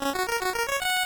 Obtain Item, NES; Solstice

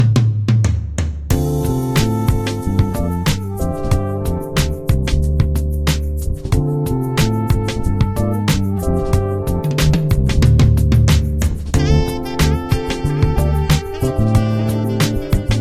Alien Jazz Rap; Overworld 4; Pocket Mortys

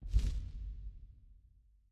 Desert SFX, Big Flower Open

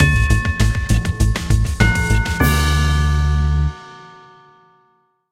Merry Feastmas (Transition); Cooking Dash

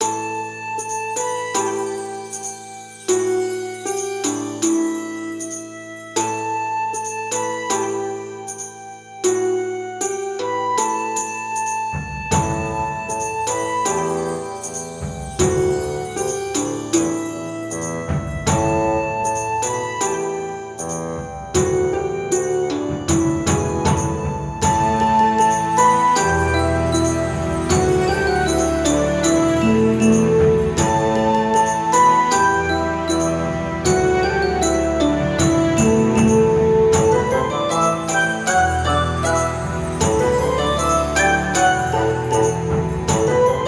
Local Folk; legrand legacy tale of the fatebounds

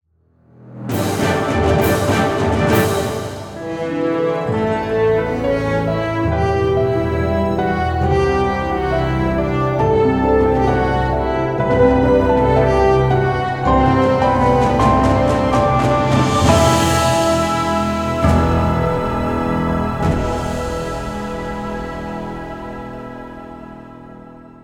stinger game over win; roguebook

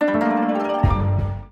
Bootsound; Cake Ninja 3 The Legend Continues; Wii U

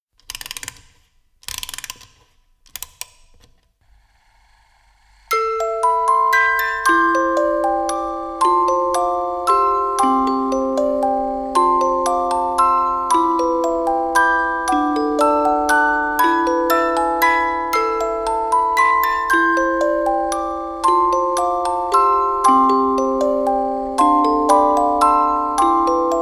Orgel; Gust 20th Anniversary Atelier Sound Set 1997-2014

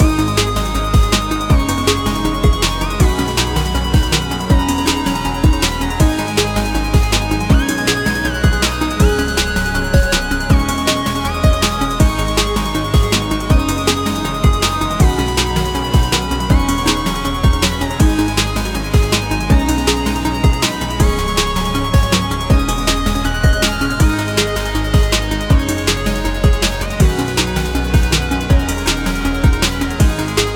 Music Ending 160bpm; Sockventure

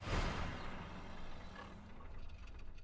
vehicle station wagon turn off

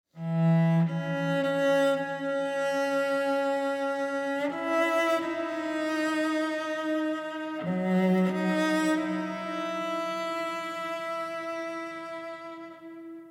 Exploration Solocello; Nora

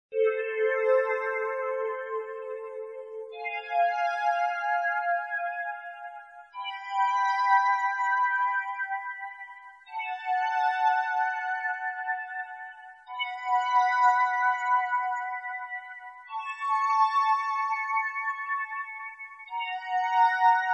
Chords; time stand still a carol reed mystery windows gamerip 2006

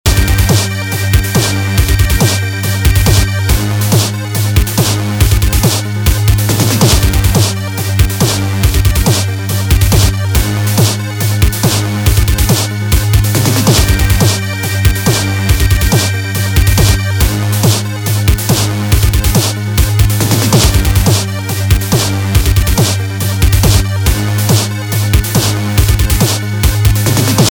Bossfight Loop

